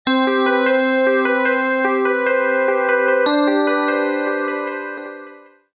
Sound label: Piano, Deurbellen